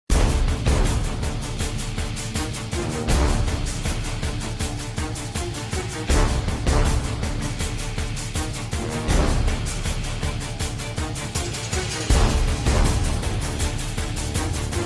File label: Battle Music; WoT